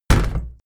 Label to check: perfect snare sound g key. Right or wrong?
Wrong